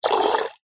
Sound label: water jet spray fast forced syringe into water; foley